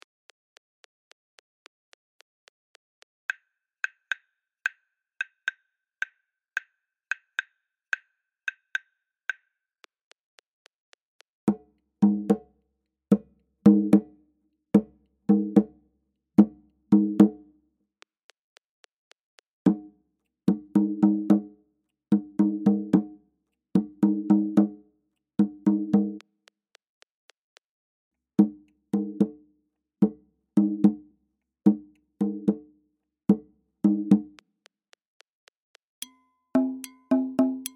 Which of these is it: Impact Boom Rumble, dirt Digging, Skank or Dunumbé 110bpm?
Dunumbé 110bpm